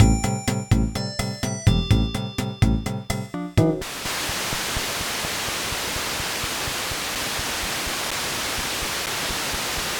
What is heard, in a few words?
pss-140 white noise